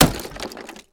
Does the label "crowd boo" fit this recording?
No